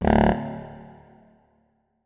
notes, keyboard saxophone; Hideout Furniture

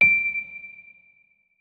notes, keyboard, electric piano

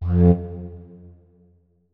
flute notes; Hideout Furniture

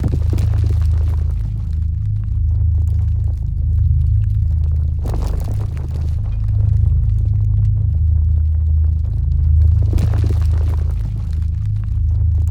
gravitational idle, anomalies; Arrival

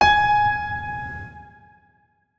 notes, keyboard piano; Hideout Furniture

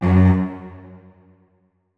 notes, keyboard; Hideout Furniture

